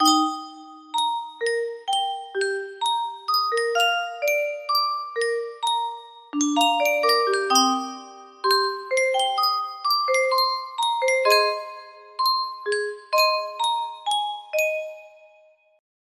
music box china popular music box songs, music box melody